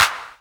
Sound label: CLAPS HOU; Best Service Dance Mega Drums